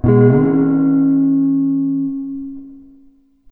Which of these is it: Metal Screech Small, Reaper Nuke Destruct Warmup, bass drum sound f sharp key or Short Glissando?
Short Glissando